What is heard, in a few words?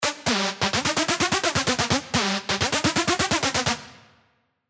LD, sylenth1 dirty dutch leads; Jumpen!; revealed sylenth1 dirty dutch leads vol 1